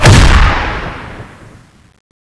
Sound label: pianogun